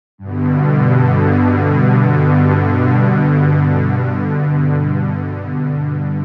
Smooth Pad, jup8; Aphelion

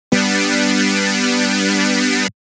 Analog Contropad; jup8

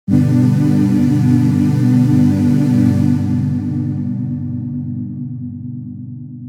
Epic Old Organ, jup8

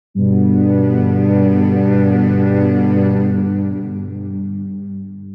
Legendary Pad, jup8

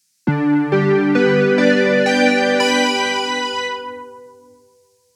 Pluck Bell; j106

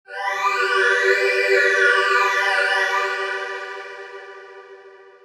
Epic Phase Pad, jup8

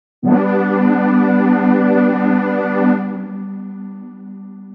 Techno Bendish, jup8

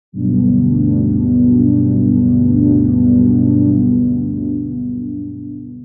Ethereal Pad, jup8; Aphelion

